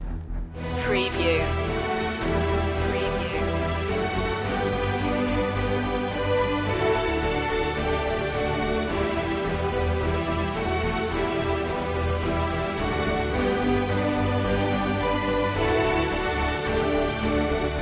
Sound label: no choir no perc